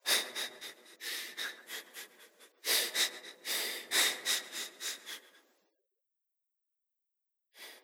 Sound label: emote cry; csgo; fortnite emotes